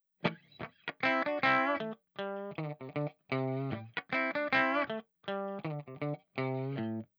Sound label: wah without